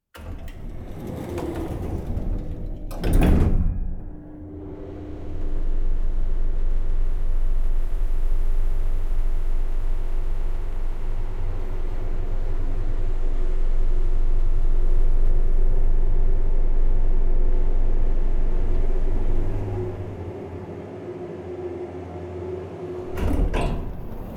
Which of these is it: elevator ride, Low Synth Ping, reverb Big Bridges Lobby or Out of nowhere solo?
elevator ride